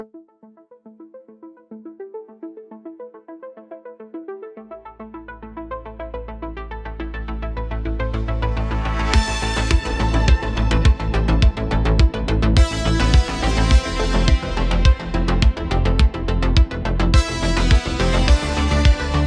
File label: Arpeggiated analog synths and a steady; driving beat, night drive vibe